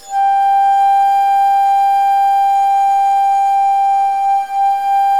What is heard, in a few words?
Bass G3, Bass Flute; Historical Instruments; USB Soundscan vol.23; AKAI